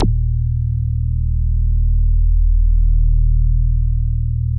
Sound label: MINI JUZY EB1, MINIMOOG; Big Fish Audio - First Call Vintage Keyboards